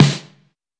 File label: snare; Reference Beat BPM-130; Pophits